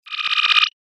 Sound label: Scan The Surface Paranormal Investigation, scary soundscape; Andrew Fleming